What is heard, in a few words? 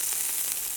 sizzling